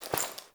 Body Move; Frumentarius; Warframe; Ambience; Nineteen Ninety Nine

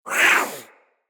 Feral Vocal Attack Short; Catbrow

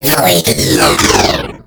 Pre Death Vocal; Daklo; Warframe; Tau; Betrayer

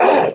Pain, Barks; Kuva Lich; Persona A

